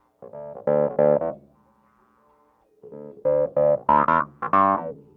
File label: clavinet, analog funk; Mixed Berry Jam; Sonic Foundry (Sony Creative Software) - What it Is! 70s Analog Funk